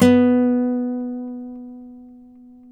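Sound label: nylon, FLAMENCO, Guitars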